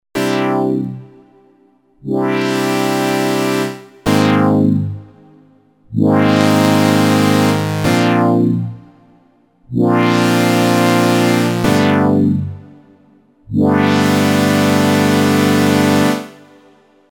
lpf

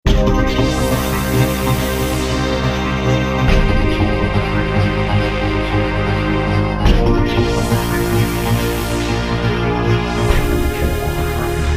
loop play; v-synth

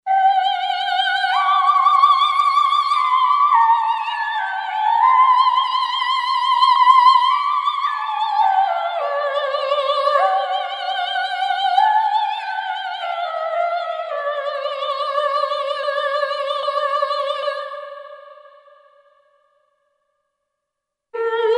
v synth opera voice

